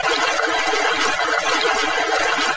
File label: small water